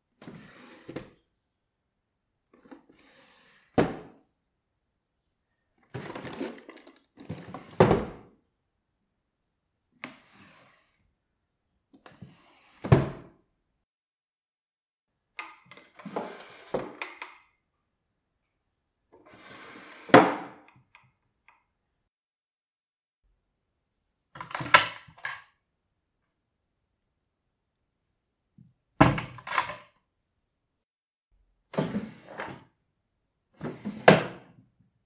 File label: Opening And Closing Drawers